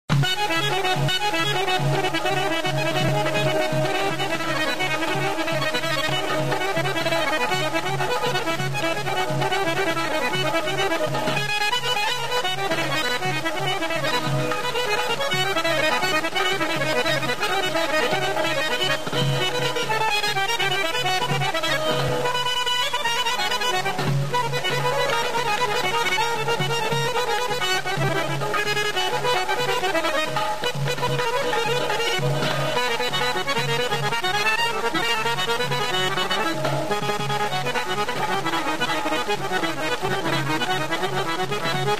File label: qarmon